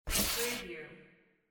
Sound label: glass cup slide